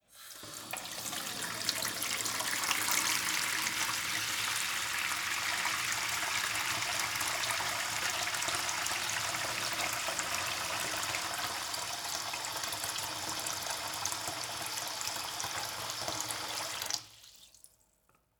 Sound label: Sink Filling with Water